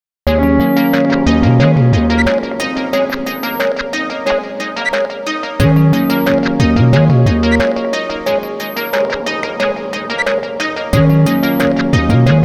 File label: end roll; milestone sound collection; contact lost